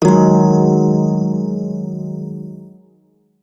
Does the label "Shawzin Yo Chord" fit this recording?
Yes